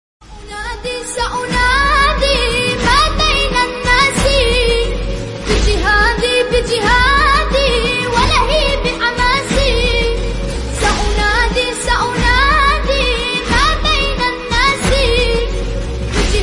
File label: Islamic Arabic Song Ringtone